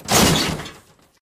metal break

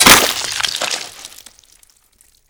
chop, Gore

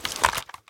launcher select

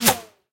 miss; Civ, Weapons, Guns

